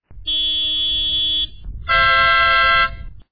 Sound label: horns, K1200RS